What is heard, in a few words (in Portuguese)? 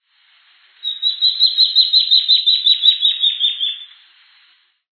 formigueiro da cara preta